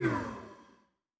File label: Spitfire LF Mk IX Fuel Pump End; Aircrafts; DCS